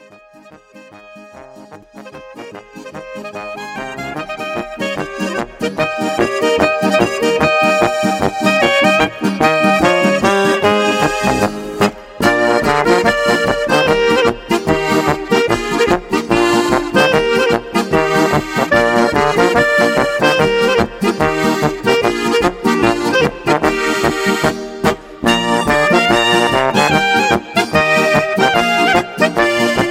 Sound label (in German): Polka